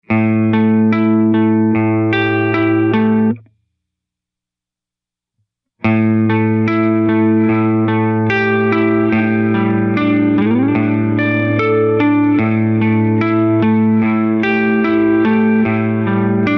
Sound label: Reverse Stop Reverse And Stop, reverb